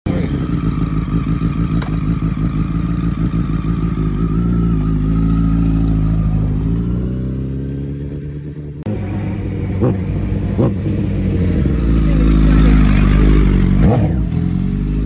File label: cbr 100; موتور